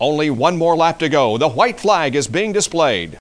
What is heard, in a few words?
Last lap; NASCAR Road Racing